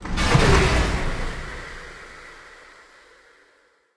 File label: bonus Coffin; bloodsuckers2